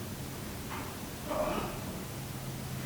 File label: painful grunt; shelton hotel